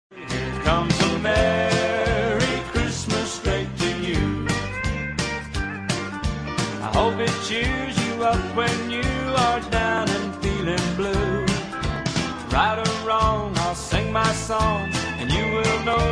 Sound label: english ringtone